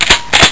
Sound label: gun pickup